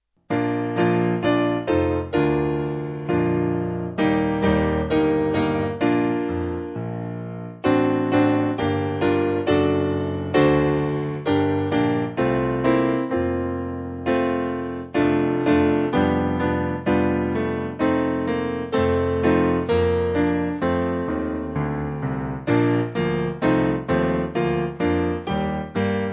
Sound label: Who Is On The, Piano; Armageddon